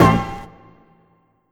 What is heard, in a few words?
Ensoniq ZR-76 Smack Hit